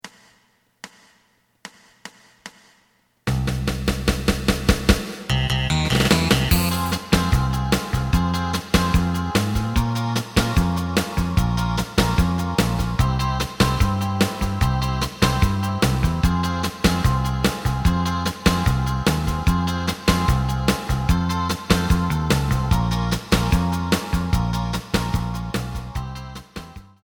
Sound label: lead